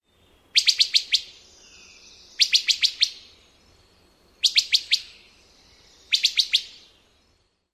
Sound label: pit volleys